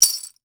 BRASS ANK, TREE BELLS; Voice Crystal - Steve Reid Definitive Percussion

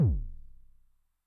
BD MOD [PItch+Depth], 909mod, BASS DRUM; classic machines

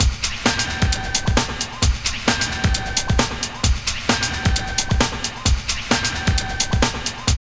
LOOP, Tekno Industrial; East West

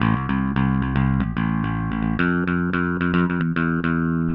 Bass, Indie Rock; Session 01 - Brighton; Sonic Foundry (Sony Creative Software); Indie Rock Anthems

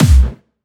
Clubby Kicks, Bassdrums

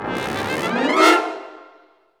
BRS RIP, LOW BRASS; Roland - LA Composer vol. 1

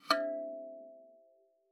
KALIMBA E5; Xsample VOL-7

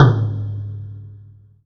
TOM, House Side Of 2 Step; USB Soundscan vol.51; AKAI; TOMS

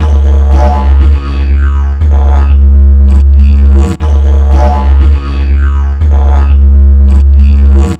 Dig Loop, Ethnic Instruments; Sonic Foundry (Sony Creative Software); The Electronic Music Manuscript Collection

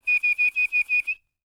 WHISTL, ANDES FX World Voices; Spectrasonics Vocal Planet

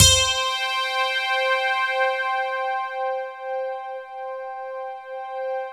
HEAVN GTR, JV-1080

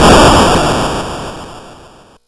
Apple II FX Atomic Blast, Chiptune

